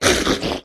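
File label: lizard attack wound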